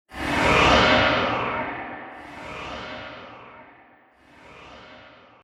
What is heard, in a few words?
Space Swirl Whoosh; Whoosh Sound Effects